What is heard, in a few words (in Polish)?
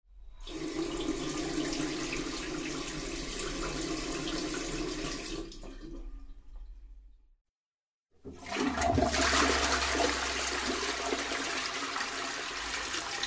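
woda, odgłosy; pieciolatki; karty aktywnosci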